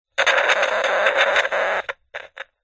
technology cb radio walkie talkie crackle interference; zapsplat